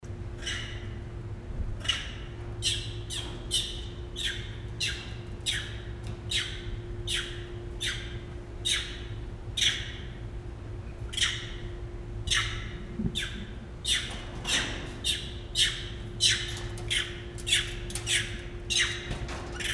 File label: female cyno w toy; adult female Cynomolgus Macaque protecting toy